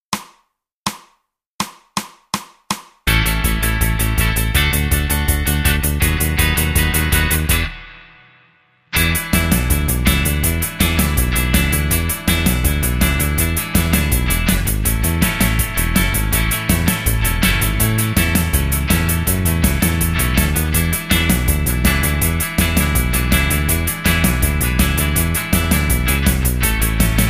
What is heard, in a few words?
backing